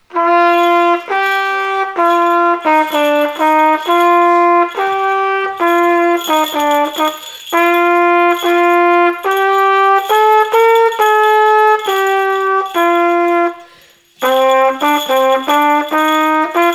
pausenglocke o du fröhliche

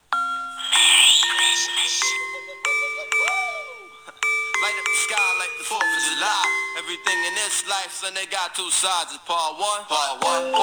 pausenglocke rap mit elektrobeat

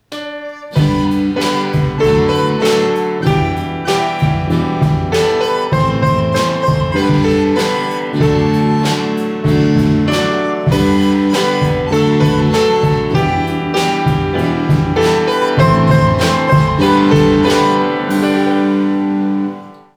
pausenglocke we wish you a merry christmas

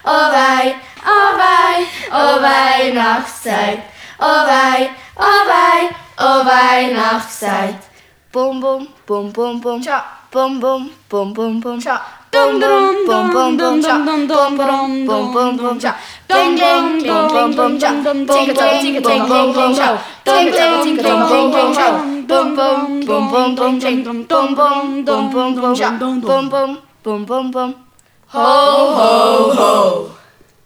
pausenglocke a capella

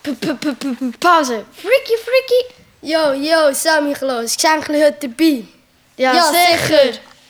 pausenglocke freaky pause

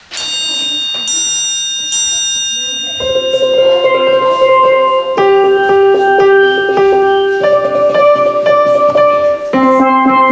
pausenglocke instrumental

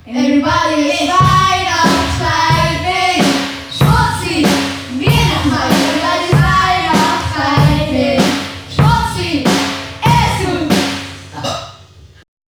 pausenglocke rap; schmutzli

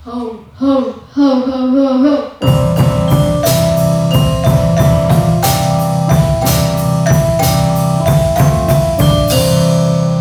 pausenglocke instrumental

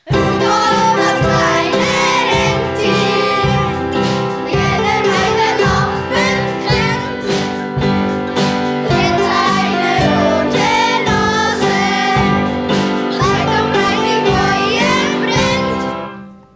pausenglocke

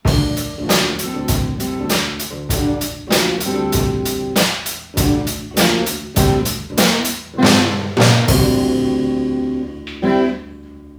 pausenglocke weihnachtsrock

